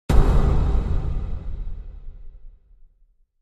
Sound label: Impact Echoed Metall